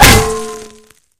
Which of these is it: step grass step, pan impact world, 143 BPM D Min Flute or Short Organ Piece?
pan impact world